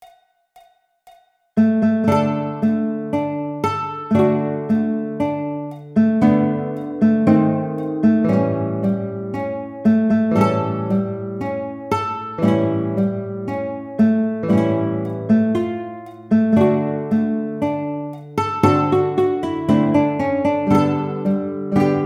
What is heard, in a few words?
Guitar, ensemble